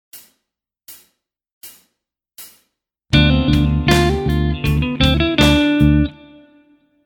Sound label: Modes Dorian lick